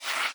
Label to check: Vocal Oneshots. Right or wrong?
Wrong